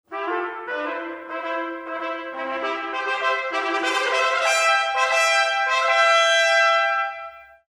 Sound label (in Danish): Trompeter; Messingblæserne